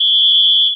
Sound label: whistle